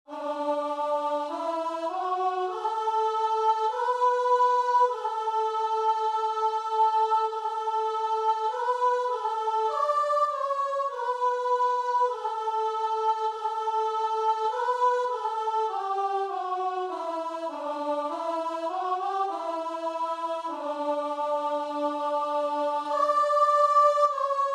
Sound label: o love divine, guitar and vocal